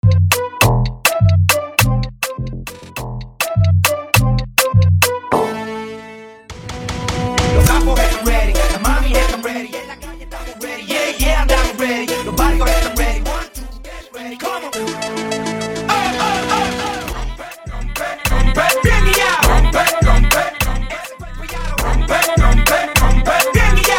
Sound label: intro afro shatta reedit 102 bpm